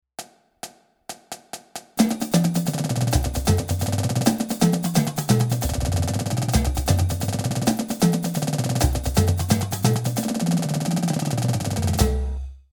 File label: Chuggeda Rolls 132, drumline